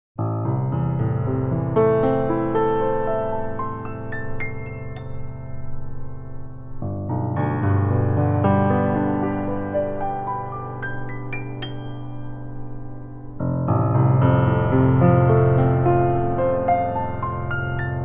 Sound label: Piano